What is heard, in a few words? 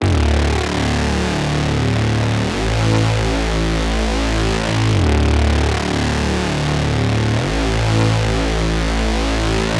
tonal loop grit bass 98 Dmin